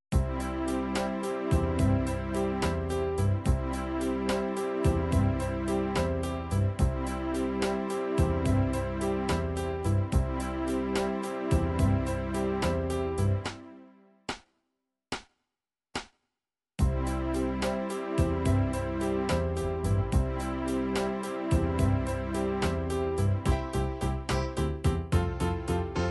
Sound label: latin, midi